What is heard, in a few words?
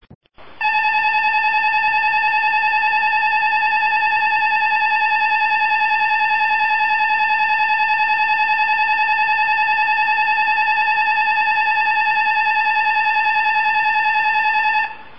fukushima bell